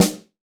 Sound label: Maple Soft Snare; Snares